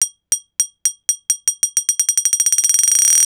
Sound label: bottle hit speed up